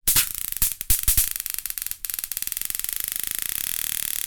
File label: end bugzapper